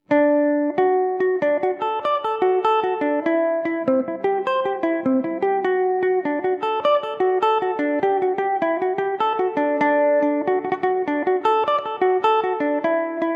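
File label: jig D mixolydian revelation, Irish mandolin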